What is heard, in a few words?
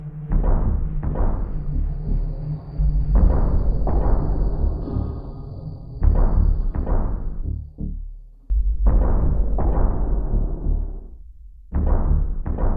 beat